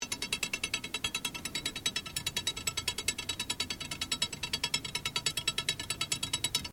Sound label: Toy Sliding Woodpecker On Pole, Strange Toys; Miscellaneous Toy